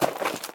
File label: ledge step; Golden Crayon Sounds